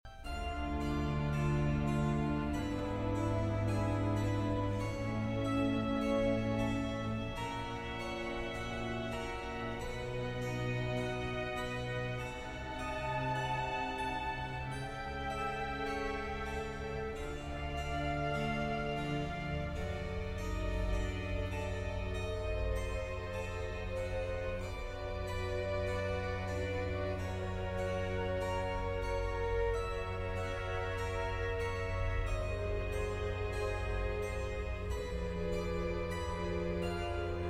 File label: Violin Concertos, Concerto in D minor